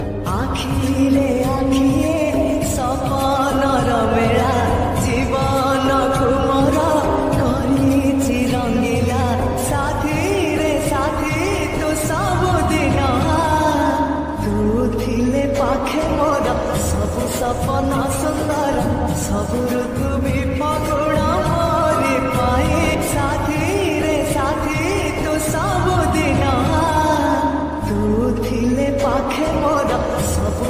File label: Odia Love Song Ringtone